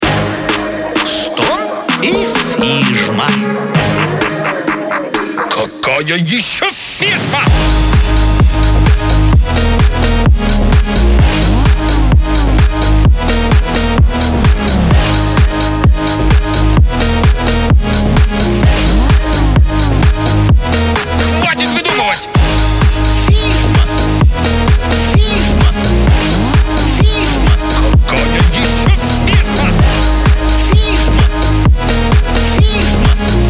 phonk

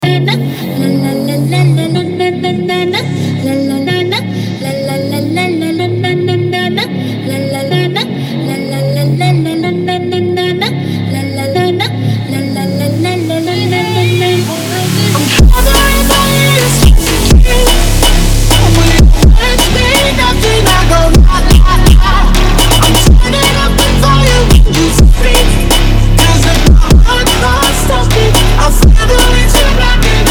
funk

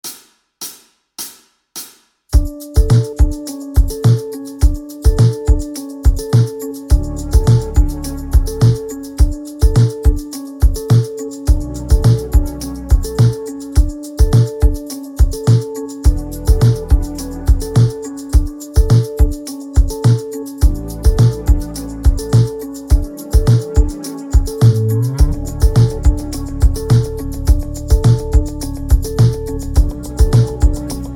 instrumental version

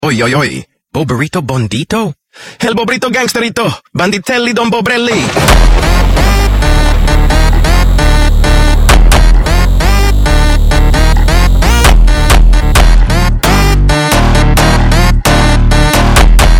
phonk groove; brazilian

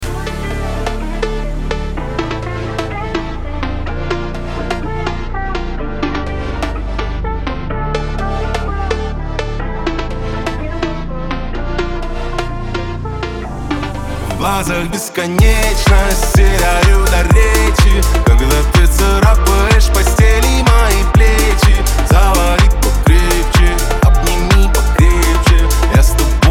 phonk remix

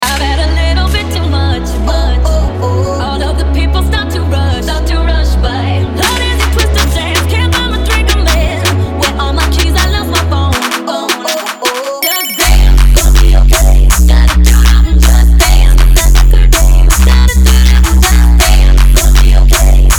hoodtrap

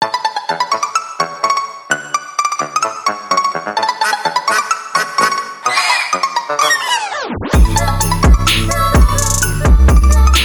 phonk remix

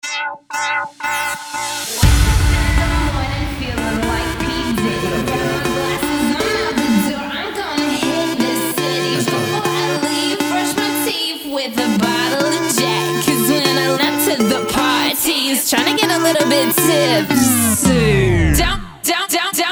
phonk

